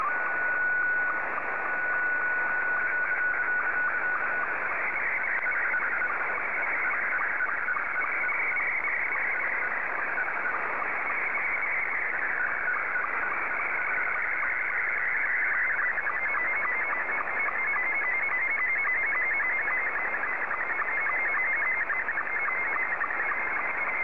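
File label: SSTV, Scottie 2; JK7BUM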